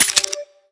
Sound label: pistol foley close slide; weapons; Half Life Alyx